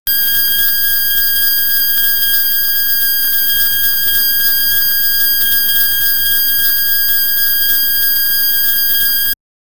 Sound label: a bank sounding the alarm